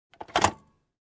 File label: Aircraft Bin Click